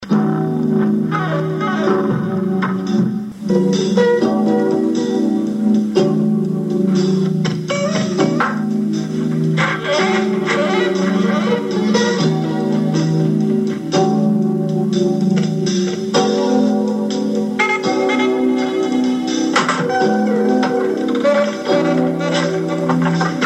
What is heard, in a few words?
romantic theme